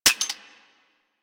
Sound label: Pistol Mech; Sentient; Crp Sent Amalg Pistol